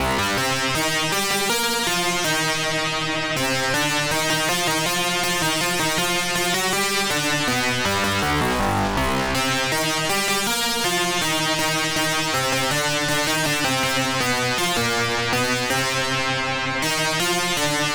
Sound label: Lead; FL Studio